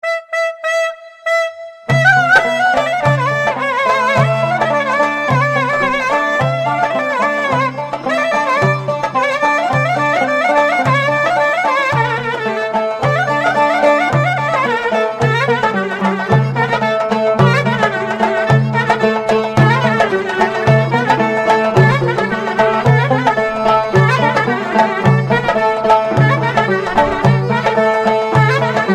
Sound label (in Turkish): develi ciftetelli; oynak zil sesleri